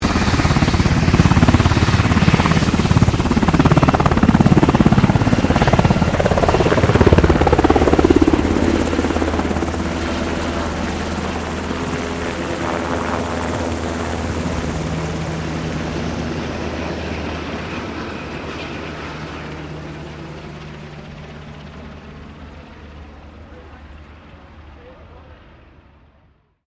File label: helicopter Sikorsky UH60 Blackhawk lift off; Vehicles; Aircraft Collection